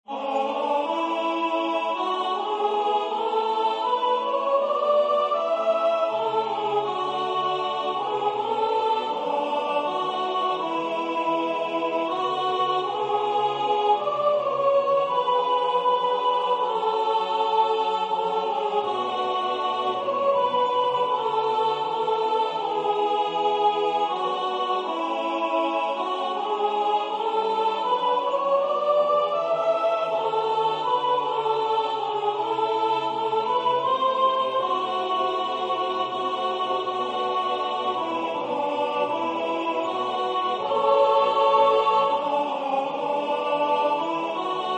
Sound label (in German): sopran; Lyrisches Intermezzo